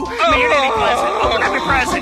bonk auuugh

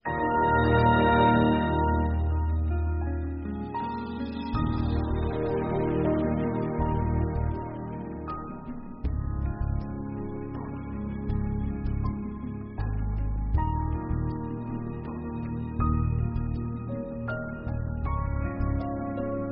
windows xp tour intro

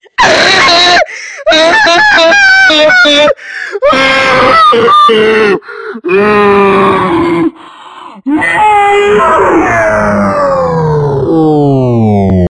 scream low version

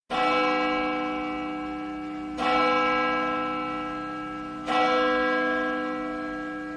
church bell ringing

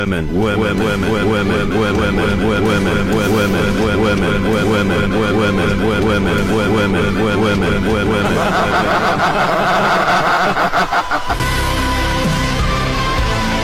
women haha

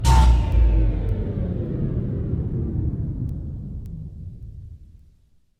power outage; in a horror game

